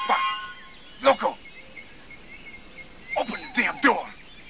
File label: door